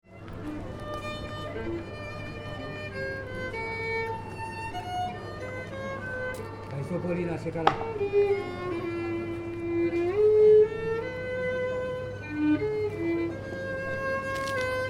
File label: Street Music Classical Violin, Man playing classical music; solo violin on street corner. People walking by and city traf; Concert Sound Effects; LynneMusic